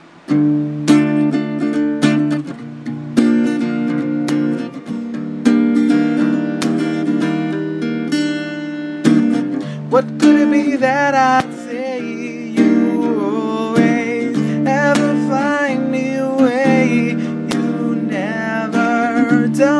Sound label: playing guitar